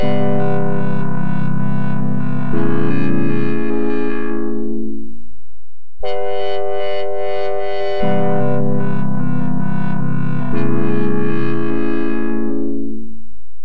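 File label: Weird Synth 120BPM E minor Ambient Synthetic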